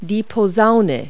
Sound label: trombone